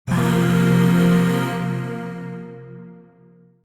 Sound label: Void Shawzin Hirajoshi Chord; Warframe; Zariman Void Shawzin